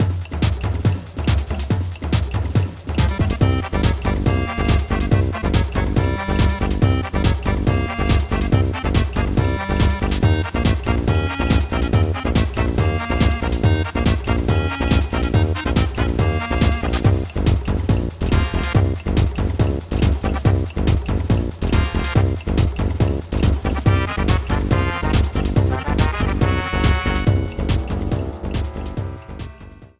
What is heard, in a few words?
Fight against an Armed Boss; SMRPG